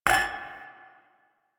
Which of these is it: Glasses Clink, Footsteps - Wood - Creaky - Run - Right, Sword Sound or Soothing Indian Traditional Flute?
Glasses Clink